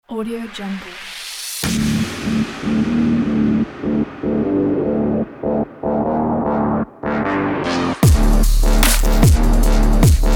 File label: this is trap